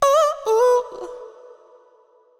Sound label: Euphoria Ad Lib Wet - 100 BPM D Maj; Cymatics; Vocal Sample Pack; Ad Libs